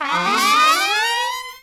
SECT BRASS FX

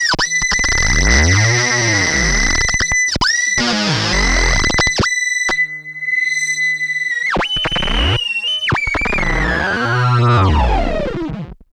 analog, special fx twisted; USB Soundscan; AKAI

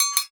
METAL; Spectrasonics; Heart of Africa

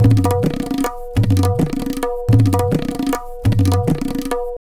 Tabla, 105 BPM; Zero-G; Pure Tabla

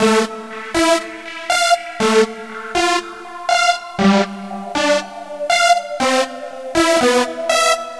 Lead & Arpeggio; Sony Creative Software; Crimson Blue and Fabulous Horncraft 4 RnB; Loop Samples; acidtechnoexpander

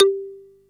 marimba G3; East West; Global Sounds

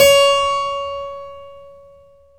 KEY HRPCR; Roland - LA Composer vol. 1

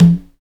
PRC XCONGA, Skin Drums

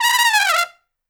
Trumpet Noise; Traditional; Sonic Foundry (Sony Creative Software); Jazz Solos and Sections

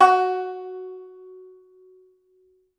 Tenor Banjo

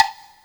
Perc, retro house samples, Drum Hits

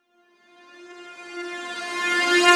Reverse Synths F; Vengeance - Essential Clubsounds Vol.4

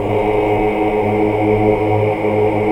AAH, AAH OCT; Best Service; Hallelujah

